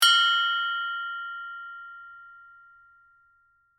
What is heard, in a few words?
Bell Ring Medium, Music, Bell, Ring, Medium; Bells (Sound Effects:Musical)